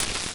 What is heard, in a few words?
Perc Hit, drums; One Shots; Drums & Drones